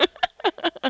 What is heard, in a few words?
Song like with notable pitch modulation female